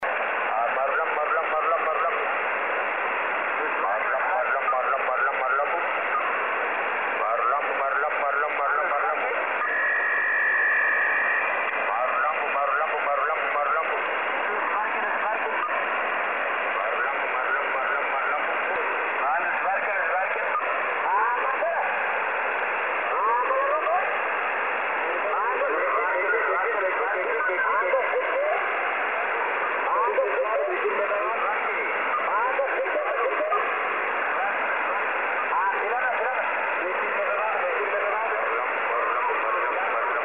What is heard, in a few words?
intruder 7MHz